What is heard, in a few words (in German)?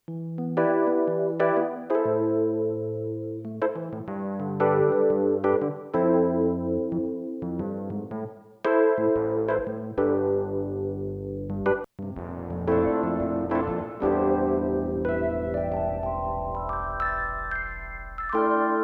Rhodes mit Modulations Rev Dly